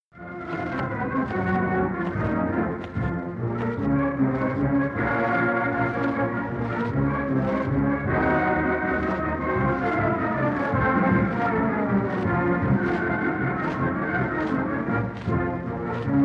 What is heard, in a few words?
Concert Band